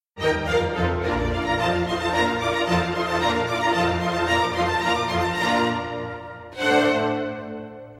Dim 4 intervals; Mozart G Minor

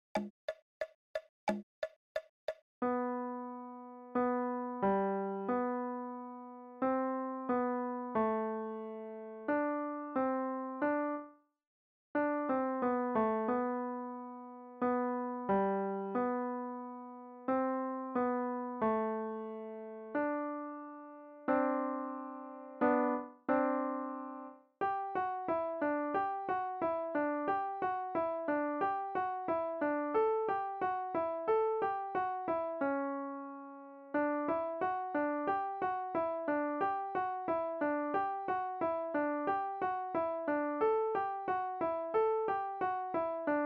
LH; Jingle Bells

